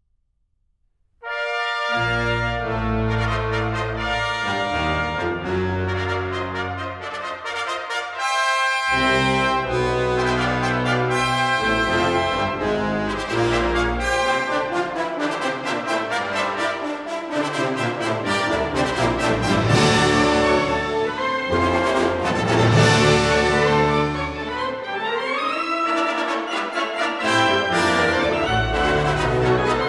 Fanfare and Choral

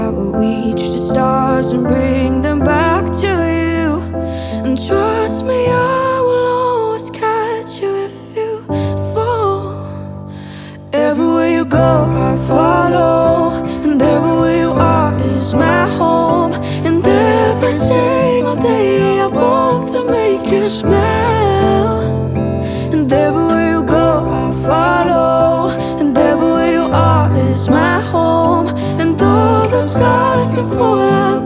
Acoustic Version

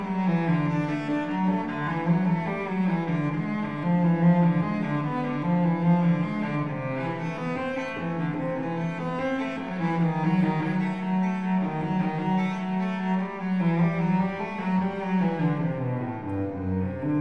Cello Suite in G Major, Prelude; BWV 1007; Csaba Onczay

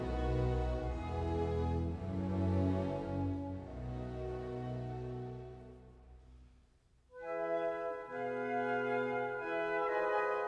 Violin Concerto; Violin Concerto in G Major Op 25 II Adagio

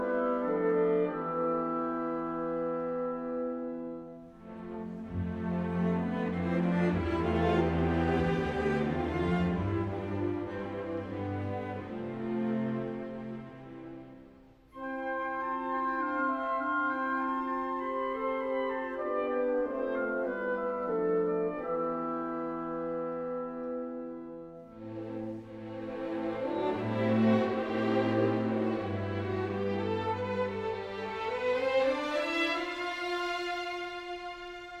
Symphony, Philharmonic Orchestra; Symphony No 6 in D Minor Op 104 IV Allegro molto

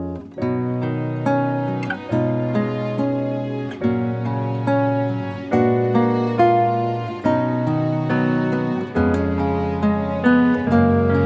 inst

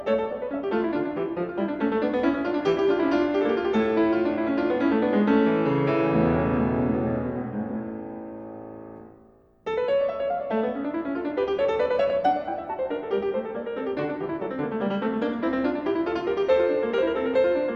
Keyboard Sonata in D Minor

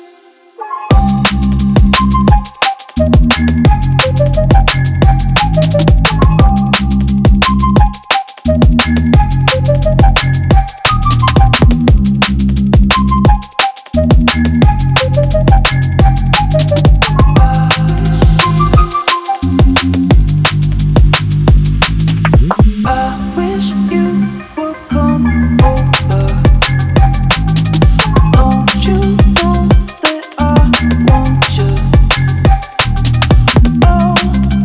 FLUTE

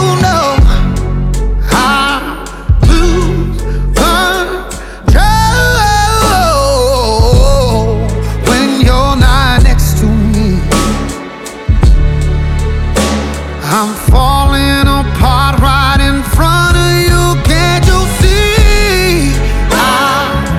Strings Version

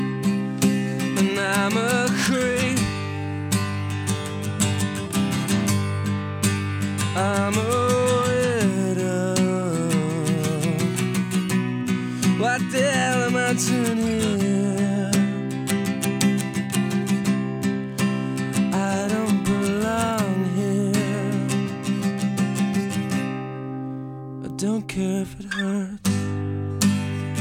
Acoustic

